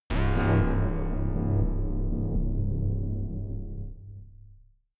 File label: blop bass